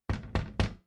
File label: door knock